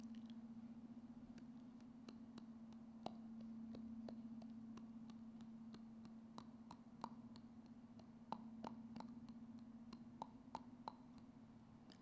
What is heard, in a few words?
mouth sounds; a sonic dance performance